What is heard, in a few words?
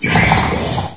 ichy pain; Source Engine; bshift